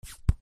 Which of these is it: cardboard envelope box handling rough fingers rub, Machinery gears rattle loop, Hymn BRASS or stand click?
stand click